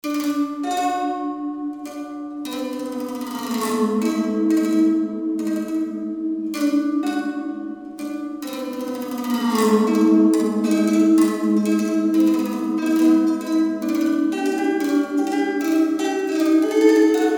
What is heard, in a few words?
String Schmeary